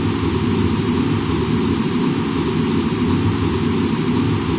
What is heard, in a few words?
Vehicles Hoverboard